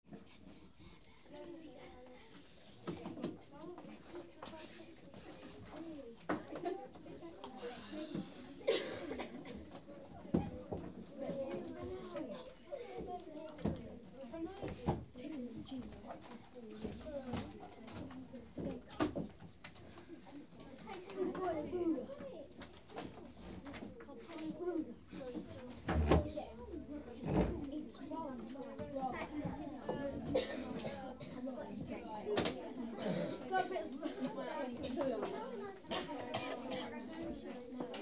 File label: Babies AND Children 8-10 Years, Audiences Children Crowds; Children Crowds Foots; BBC Sound Effects Library